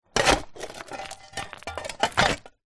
industrial shovel metal dig movements dirt large stones